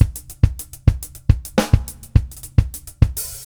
Drums, Jazz

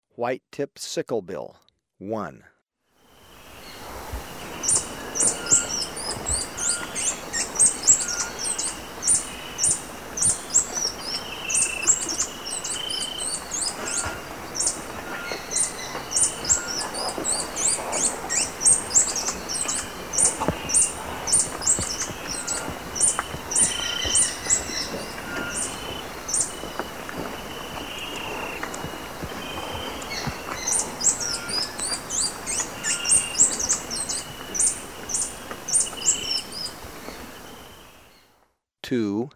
White-tipped Scicklebill; S. America

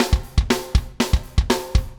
Double Time Beat, Rock Drums

